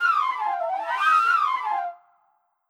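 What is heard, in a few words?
Minstrel Instrument; Legend of Keepers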